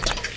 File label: Hit